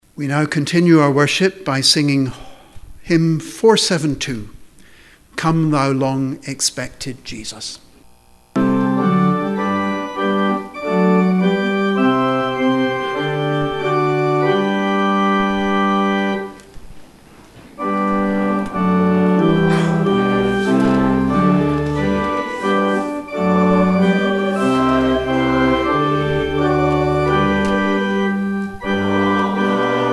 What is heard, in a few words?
hymn; Hymn 472 Come, thou long-expected Jesus; St. Mungo's